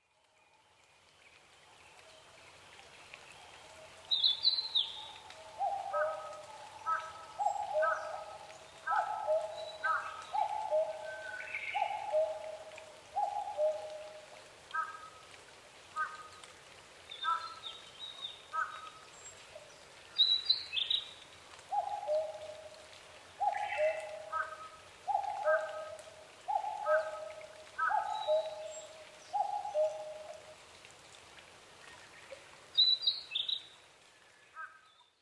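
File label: kakkou, common cuckoo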